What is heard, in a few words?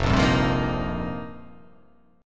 F 9th Suspended 4th F, chord; strummed; JGuitar